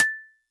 Xylo Snare DX100 A5

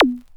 Pitch Pluck Vinyl Synths C3; vinyl perc

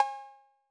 Cowbell A 808; Clean; Digital

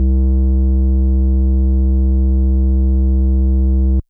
FM Sq Dist DX100 C1; square

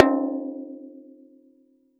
Tom

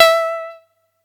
atari 520 st

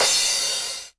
Crash Classic

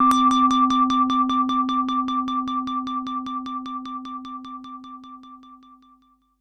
SYNTH Beauty Bell; Bellio

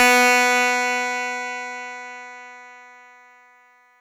Fuzz Clav DX100 B3

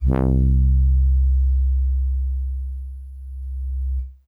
Soft Horns DX100 C2

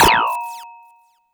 Sine FX

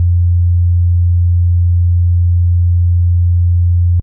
Clean Sine DX100 F1

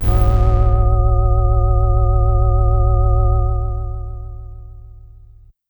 Synth, Flute And Log Dreamy

